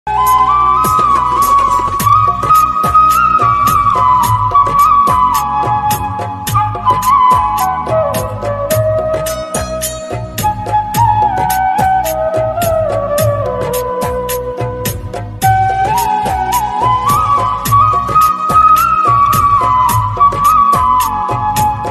flute ringtone flute ringtone instrumental

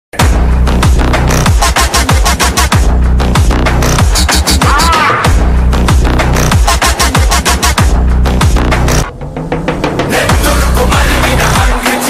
og glimpse bgm ringtone; melogrov

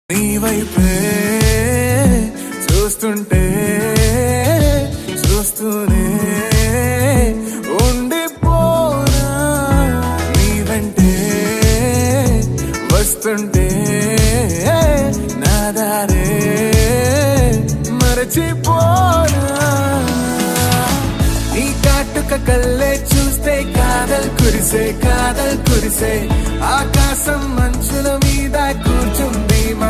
song; telugu songs

